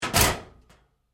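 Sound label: Door Locker Close Close Up Light, Metal Door; Doors; Big Room Sound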